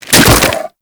Infested Ancient Grapple Throw

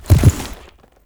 Horse Jump Land Heavy; Vehicles; Foley Actions; Warframe; Duviri

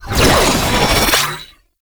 Stab Cloak Cast; Enemies; Abilities; Warframe; Tau; Dax Ninja

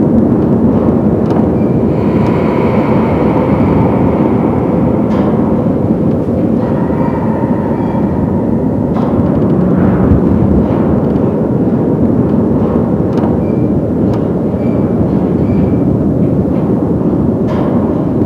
Forest Missile Silo Amb; Warframe; Ambience; Grineer Forest; Interior

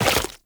Run Goo, footsteps; Warframe; Tenno

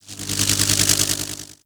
Magnetized Random; Warframe

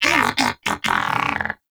Pet Tenacious Disagree; Pets; Warframe; Moa

